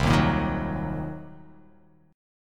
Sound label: C Minor 7th Flat 5th, chord